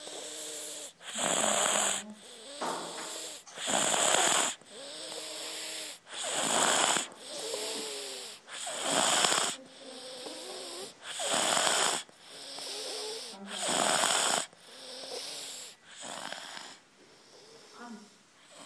snorker

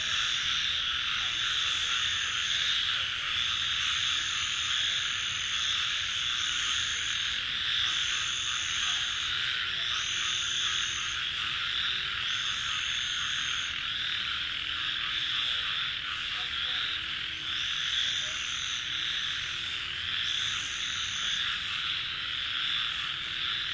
tomb frogs; tu duc s tomb

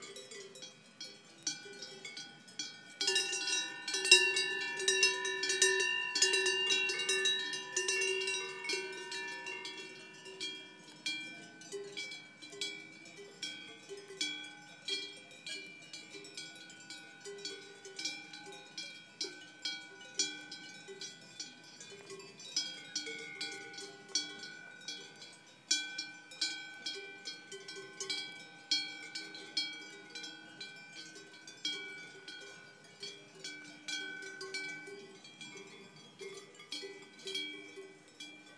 cow bells

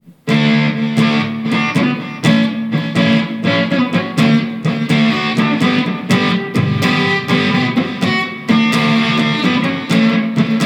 messing with chords

